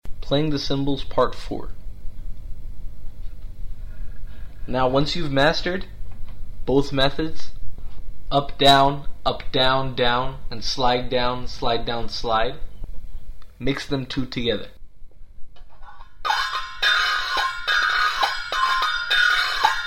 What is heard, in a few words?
Playing the Cymbals